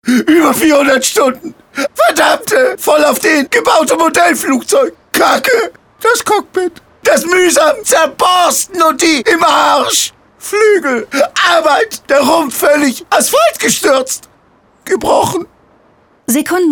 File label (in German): modellflugzeug; funkspots; ein scherbenhaufen; herlitz